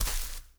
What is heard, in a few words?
Foot Grass Walk; We Happy Few